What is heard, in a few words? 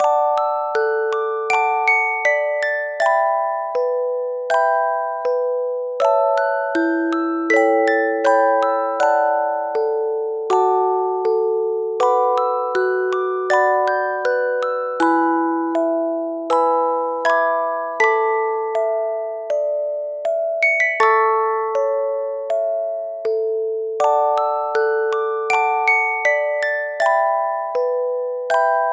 musicbox tender